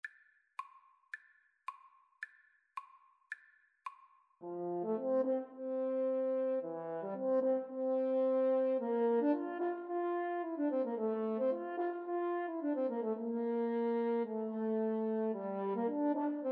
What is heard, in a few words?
French Horn duet; schubert sym 5